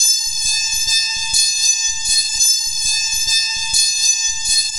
Psaltery; Sonic Foundry (Sony Creative Software); Myths of Technology

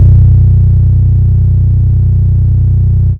BASS FRET; Big Beat Samples & More

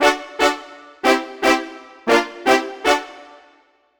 Brass Stab; Synthetic Winds; Dr. Fink's Funk Factory

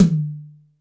C3 - Room Tom, Yamaha PSR-E323 Room Kit

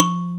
THUMB piano F2; AKAI S6000 CD-ROM - Volume 1; MALLETS

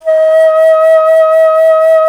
Alto Flute, Solo Instruments

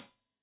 Hat Tight, Hi-Hat, Cymbals; Deeply Sampled Drums

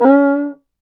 CUICA, ETHNIC PERC; Roland LA

